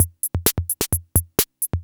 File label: drum 130, techno trance drumloops